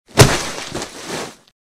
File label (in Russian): v grjaznye listja; Звуки падения человека